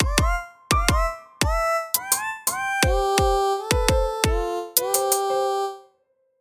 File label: RDrum Mix